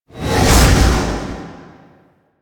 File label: Guardian Shell Cast; Warframe; Focus; Vazarin